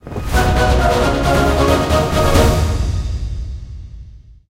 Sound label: free spin outro; golden tavern